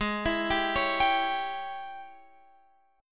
Ab Major 7th Suspended 4th Sharp 5th Ab Guitar Open F; arpeggiated; x,15,16,14,16,14; down